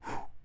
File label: woosh; abstract; Free Sound Assets